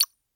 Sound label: volatility; Colin The Cat; wazdan